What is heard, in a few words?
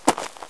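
player wood scrape; svencoop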